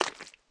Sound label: flesh